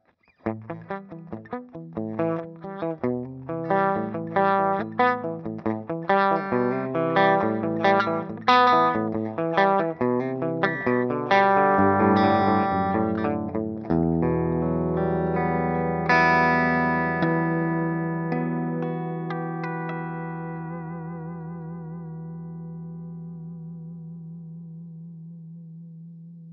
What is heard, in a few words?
Clean Picking